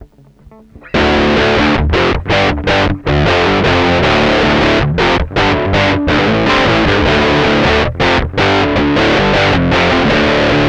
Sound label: Boogie